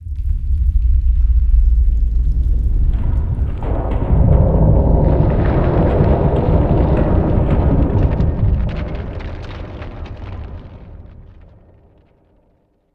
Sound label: random rumbles; Events; FEAR